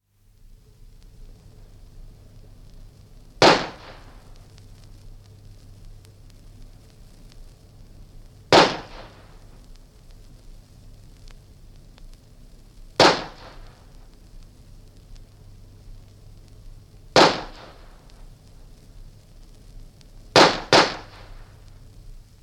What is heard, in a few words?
Single P, Single pistol shot - exterior; from 78 disc; BBC